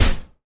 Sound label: IMPACT Energy Solid mono